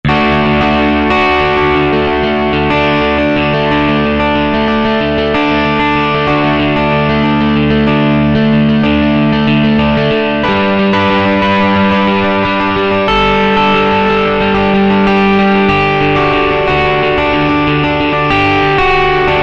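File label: Edgy Strat, guitar, Yamaha EX5R; Factory presets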